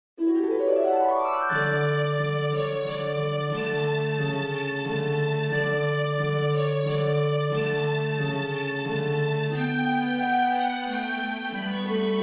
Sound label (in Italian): Piccolo Valzer